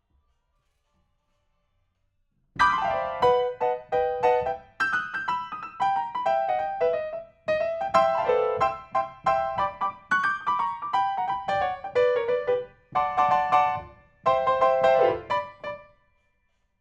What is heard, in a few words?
PIANO, RNR for piano